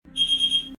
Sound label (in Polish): dzwonek